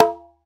conga batter

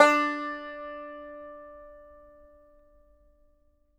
banjo D4, banjo notes; Sampleheads - Whole Lotta Country 1 & 2

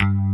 PICK BS, JV 1080; Pro-Rec - Synthsonic

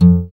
GUI AC, Acoustic Slap, Roland; Sound & Vision; Gigapack I CD 2

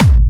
Trance Kicks

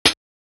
Hats, Alesis Performance-Pad

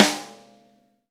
V-Pop Snare, Roland BK-5; Snares

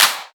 Claps; Vengeance - Essential Clubsounds Vol.5

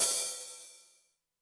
open hihat, Zoom MRT-3B

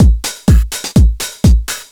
taurus, Deep House 125bpm

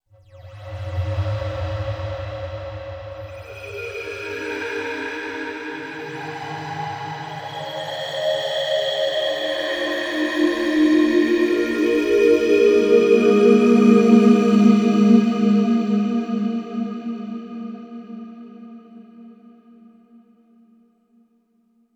Night Keys; KJ Sawka; Drum n Bass & Beyond

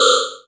Buchla Modular percussion; Buchla Modular System 200; Drums

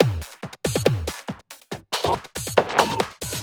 Loops 140 BPM Hihat Percussion Loops

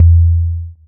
KICK IN E

